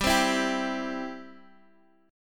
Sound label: G 6th Suspended 4th; G6sus4 strummed